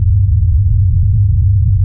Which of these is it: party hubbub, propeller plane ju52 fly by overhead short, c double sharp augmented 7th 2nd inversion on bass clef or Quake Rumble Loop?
Quake Rumble Loop